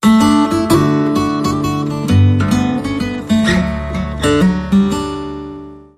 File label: Mazurka